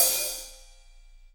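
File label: hi-hat, Real Kit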